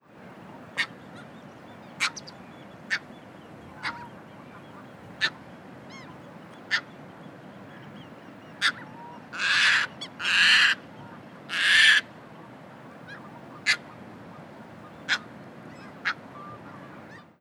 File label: Caspian Tern Hydroprogne caspia